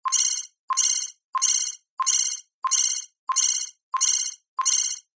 science fiction computer console alarm beep warning countdown